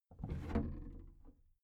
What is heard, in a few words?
big plastic case lid lift soft